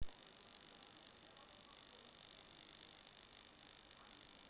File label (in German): brumm